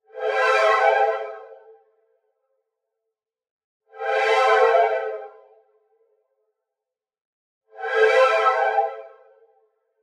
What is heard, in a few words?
126 Am Weird Music